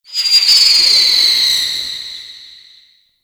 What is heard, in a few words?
Hazard Spark Projectile; Gameplay; Stadium; Warframe; Nineteen Ninety Nine